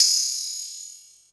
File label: PERC, 160 BPM, Drum & Bass; Ueberschall; Drum & Bass Flash Trackz